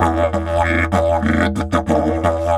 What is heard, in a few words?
Didge 93 BPM, Didgeridoo